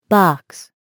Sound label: box